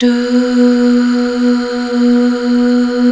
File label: HAL DUH F, HAL Back.Duh Fem; BACK. DUUHH